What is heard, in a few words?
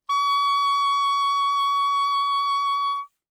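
Soprano Saxophone vibrato ff D6; Woodwinds; Bb Soprano Saxophone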